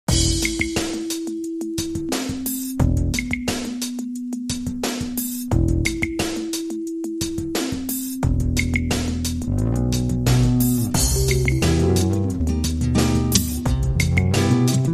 Acid Jazz

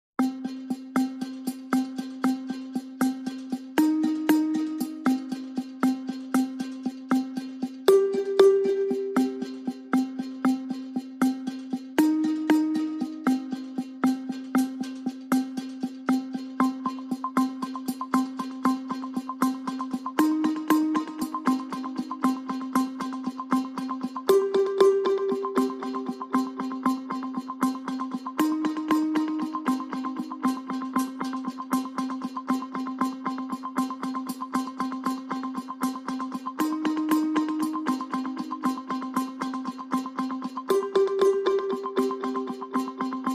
Lead Motif Only

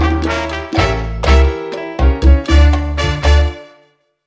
Hit

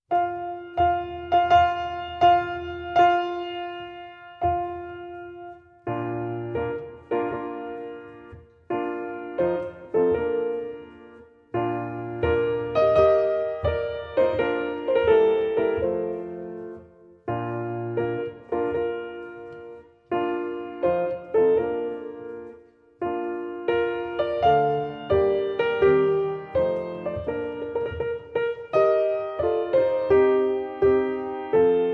Bridal Chorus (piano solo)

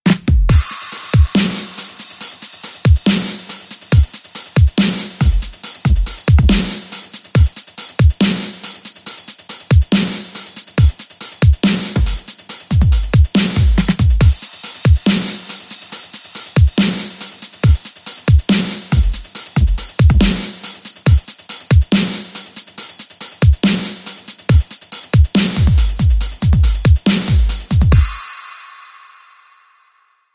Beat

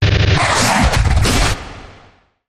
Hard Flutter, Taylor Grand

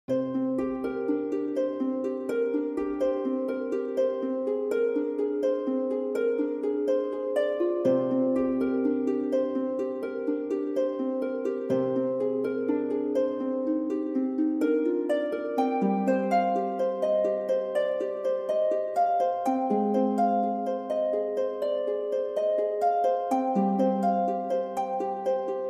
Harp Only

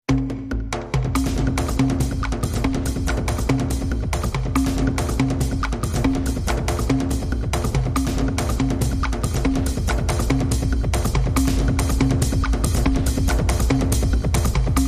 Drum Fest

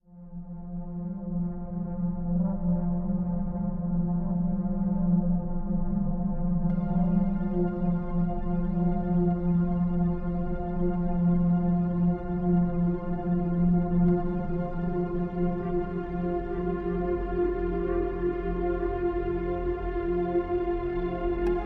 Drone, Light

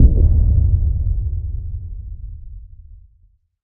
Hit Low Dive

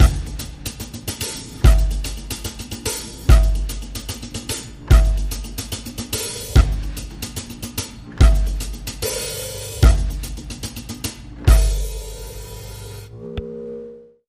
Breaker Action B Drums Only

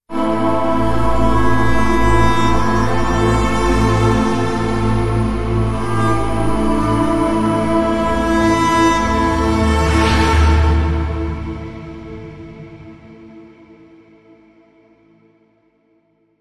Long Sting

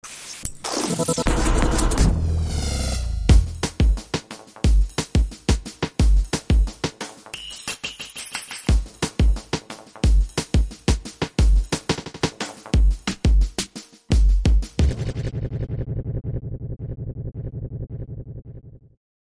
Beat Street

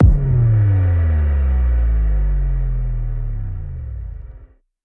One Shot Sub Boom Hit

